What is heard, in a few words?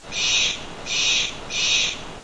jay; The Yosemite Collection; Curtains; Vernal Falls